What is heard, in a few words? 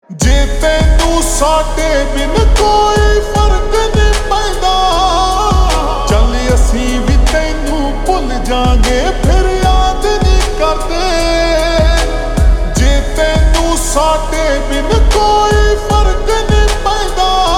Slow Reverb Version Ringtone